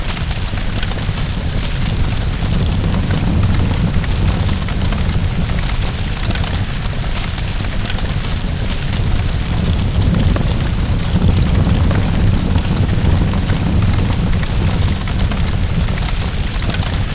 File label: ambience disaster fire